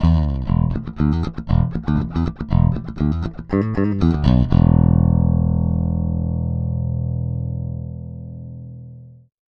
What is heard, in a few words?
Ibanez EHB1006MS Pick Bass Boost High Boost active